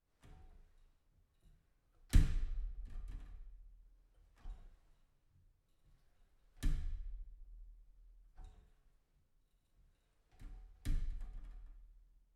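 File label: glass shower door; steklyannaya dver dusha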